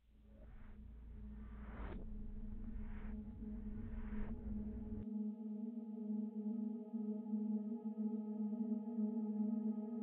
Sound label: Ambient start; miside demo windows gamerip 2023